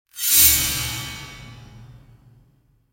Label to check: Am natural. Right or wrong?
Wrong